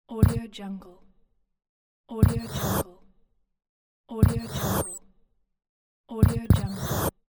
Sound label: shots from plasma gun